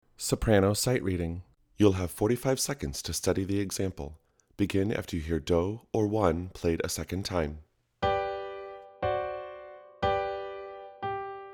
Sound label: Soprano